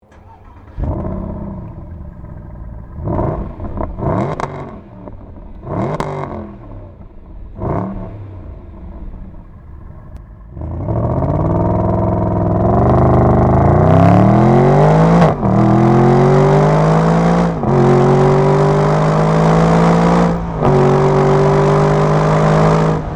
Mercedes Benz SLS AMG

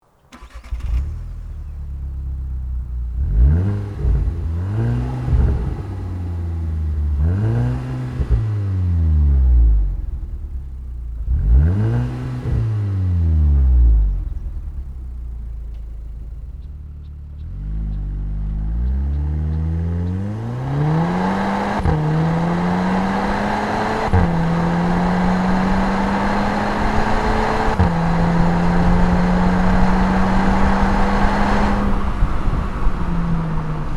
Volkswagen Scirocco R

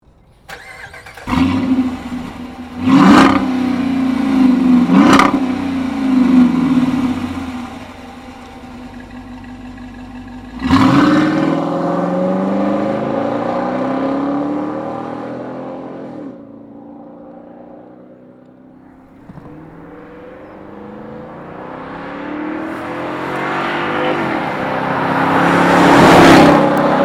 Dodge Durango RT

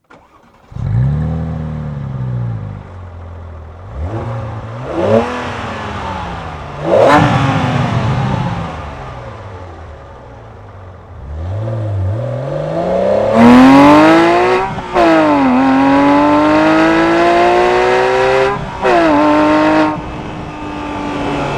Porsche 911 GT3

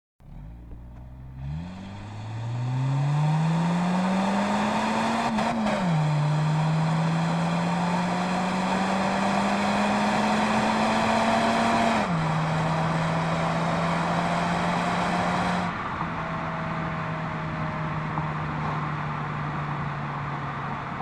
Honda Fit Sport

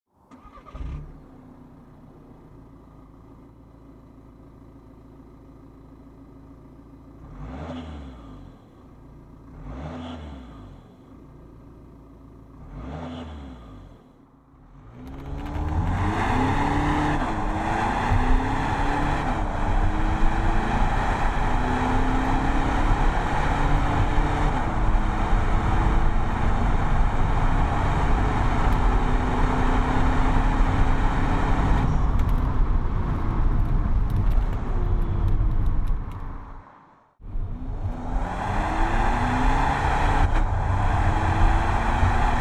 Audi A3 TDI

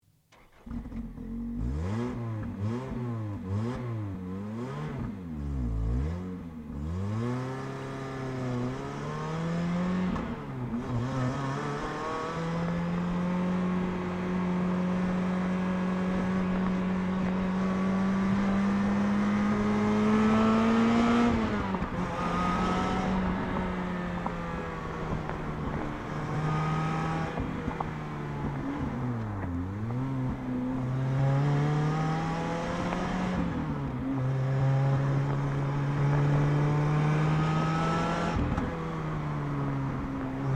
Lotus Elise S C

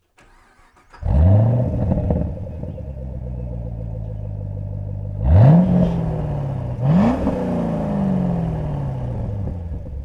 Chevrolet Camaro SS 1LE

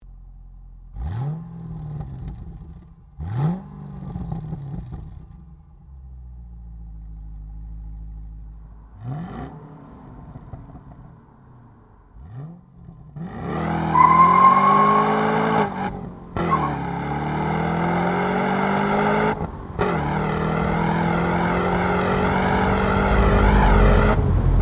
Exhaust

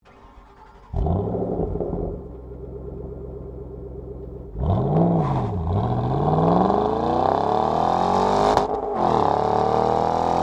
Ford Mustang Shelby GT500

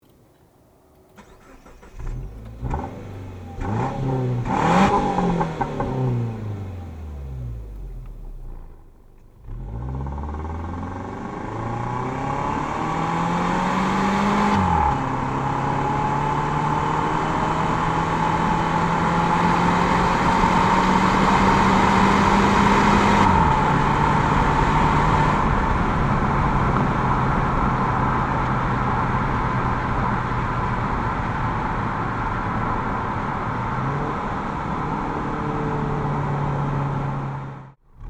Subaru Forester 2.5X

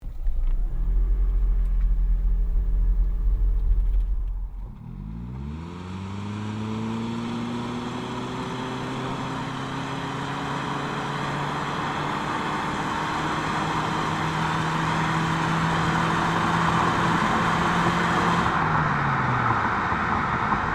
Toyota Prius

